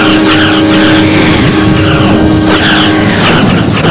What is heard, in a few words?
Phaser